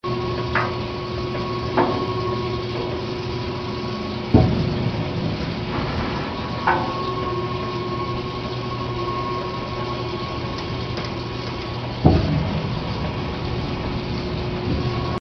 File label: oil